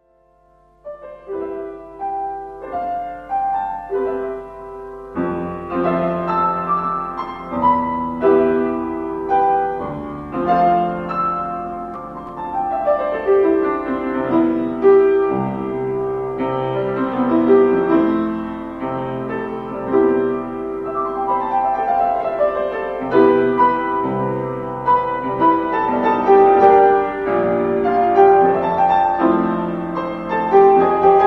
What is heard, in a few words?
background; musicoterapia